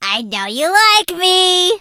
hurt vo; Colette

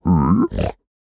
piglin idle

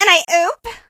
hurt vo; 艾魅是一个冲浪女孩/僵尸，语音中包含着各种网络用语还有标签。

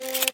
reload

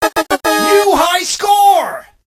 8bit lead vo

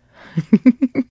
trader giggle; 商人杰恩（七日杀）

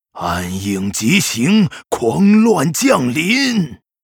Shadowhunter warcry

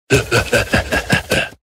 Lumberjack Laugh

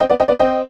8bit piano hurt

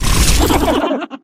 drill up

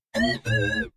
warning beep; 堡垒（守望先锋2）

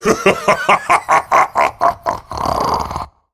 laugh; Rengar; 雷恩加尔; 英雄联盟; 傲之追猎者

